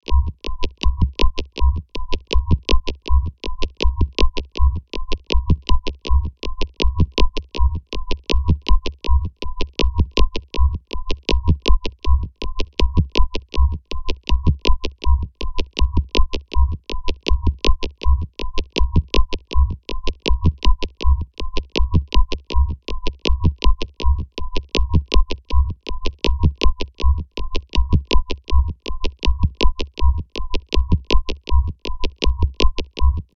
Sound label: clicking stomping rythm